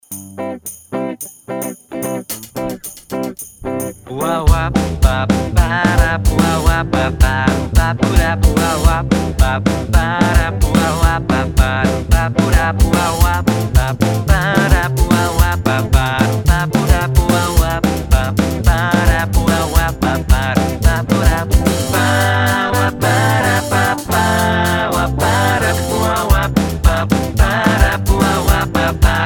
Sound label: uah uah bap padab